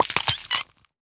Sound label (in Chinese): 幼年海龟破壳声